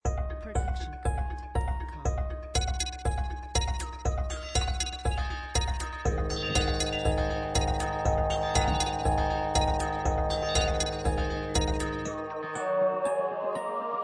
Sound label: March; Mongalien